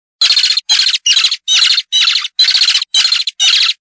Meadowlark

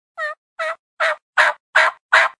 Soft Hen Yelp

